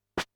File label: snare, Drum Machines, Formanta Radio Plant Polivoks